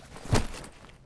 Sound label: Drop Sack; Civ4 warlords